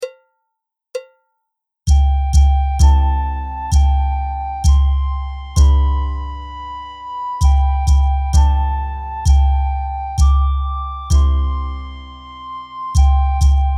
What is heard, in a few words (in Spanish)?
cumpleanos feliz con percusion